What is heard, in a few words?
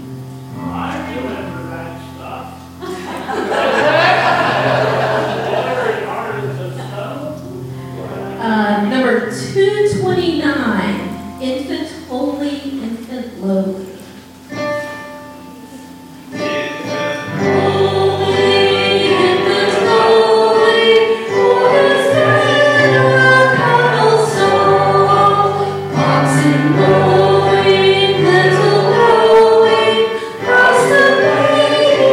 Infant Holy Infant Lowly, Carol Sing